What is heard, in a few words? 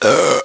BURP